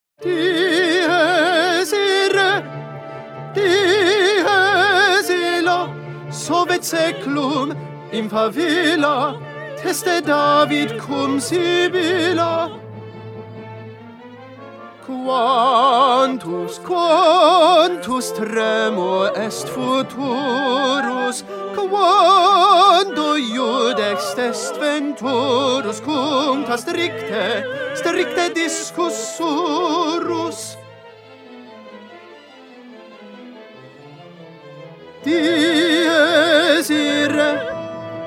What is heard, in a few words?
Tenor Dies irae; 2020 Mozart Requiem